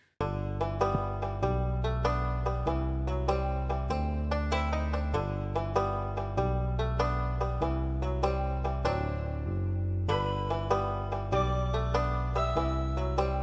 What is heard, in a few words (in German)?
bibliothek musik; stardew valley